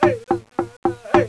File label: click